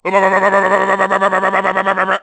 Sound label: electrocuted; Klump